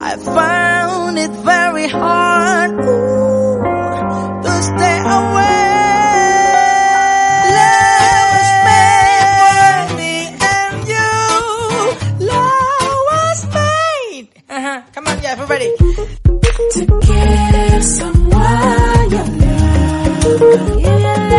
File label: indonesian love ringtone